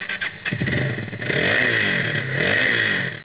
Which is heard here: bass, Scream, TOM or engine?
engine